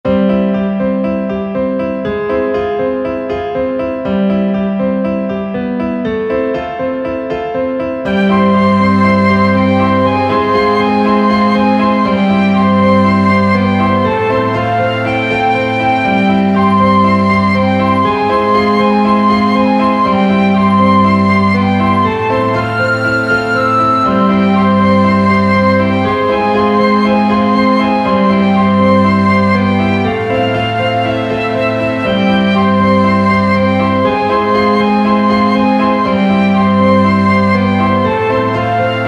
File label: Tripping through the wood. Light and airy, flute, piccolo, piano, violins; light and hap; Orchestral score samples